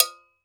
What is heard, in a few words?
GANKOKWE, African Perc; E-MU Producer Series Vol. 7 – Old World Instruments